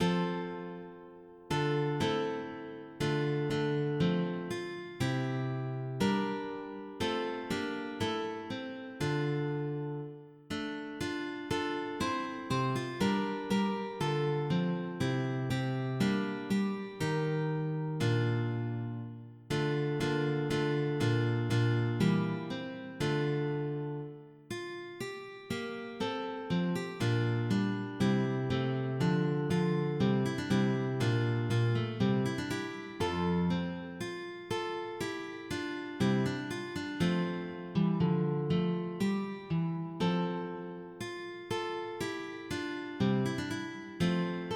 Renaissance lute; Voi Sapete

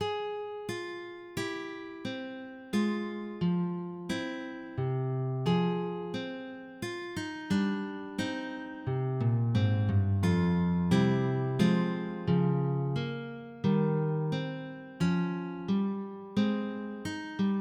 Prélude, MIDI

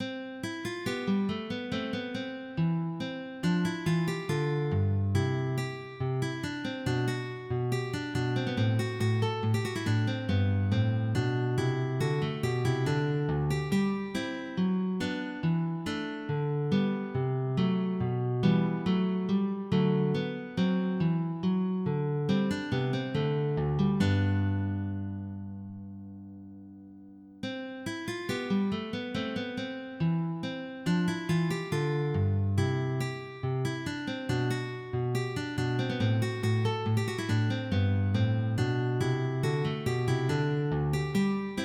Sonata, MIDI

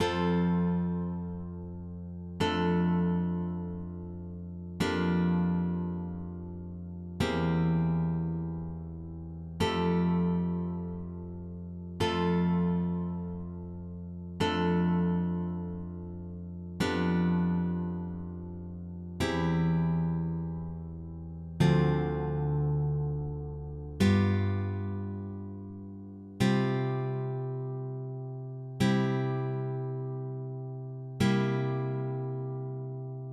Sonata Arpeggio

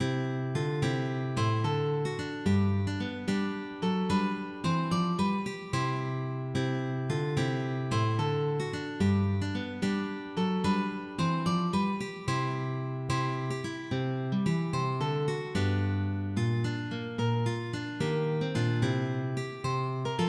Jig

